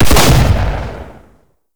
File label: Shotgun Shot; Warframe; Tenno; Alchemist